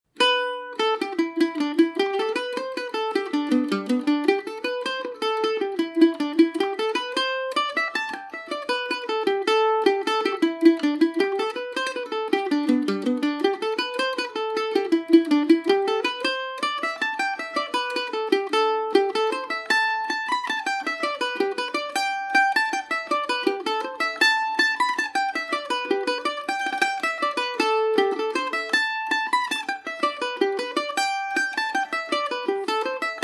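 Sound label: dooney rock a dorian, mandolin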